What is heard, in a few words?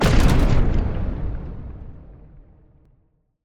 unit Explode; explosions; Mindustry